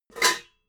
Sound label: pots clank hard